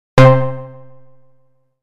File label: Three Pulses, synth lead